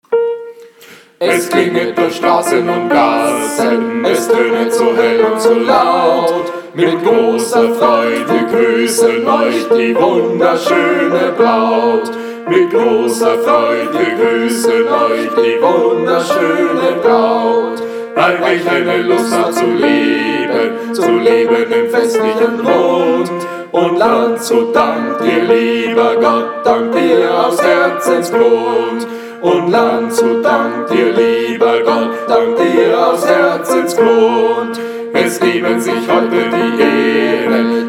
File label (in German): ALLE STIMMEN; Gesang; Willkommensgruss